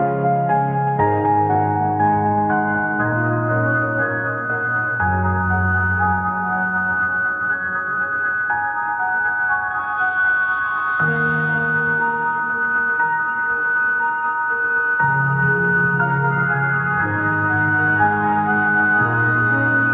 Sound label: ringtone anime